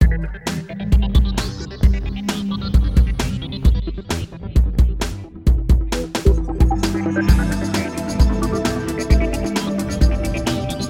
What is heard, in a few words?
Fill